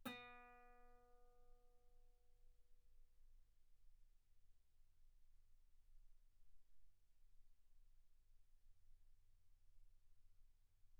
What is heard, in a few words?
Guitar B3; ff; sul B